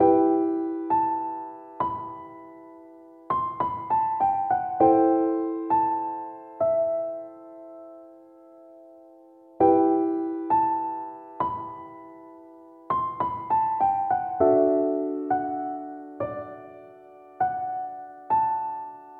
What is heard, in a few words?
Silent Dramatic Piano